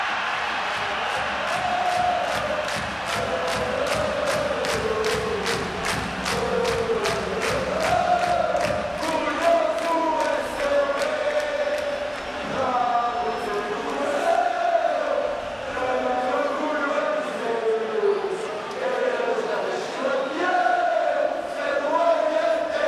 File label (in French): Chants; lisbonne